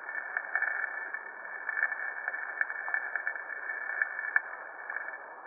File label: rotary